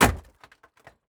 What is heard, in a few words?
ice hit